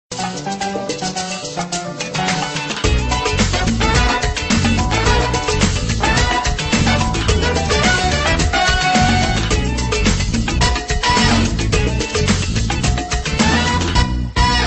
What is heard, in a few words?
Sms Rumba